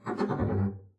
arco noise jumpy, double bass; dsmolken; noises

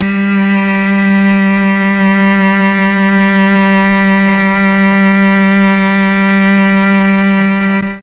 G3 mellotron cello

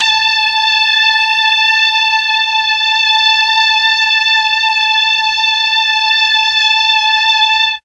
A3 16 violin, mellotron; TRON 16VLNS

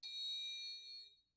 triangle hit mp muted; Idiophones; Struck Idiophones; Triangles

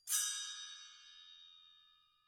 bell tree scrape; Idiophones; Struck Idiophones

